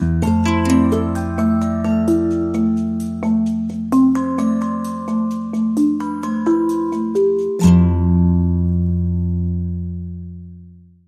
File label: Sting Mysterious